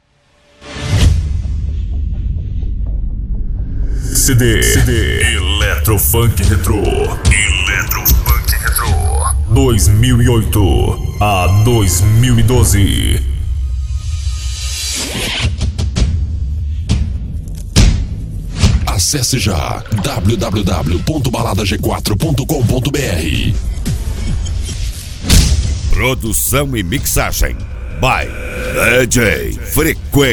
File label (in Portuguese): eletro funk retro